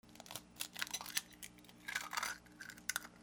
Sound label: KITCHEN